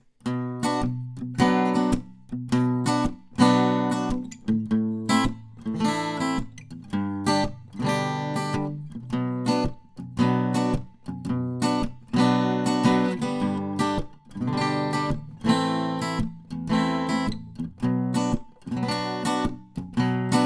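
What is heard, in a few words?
acoustic guitar; shure pg42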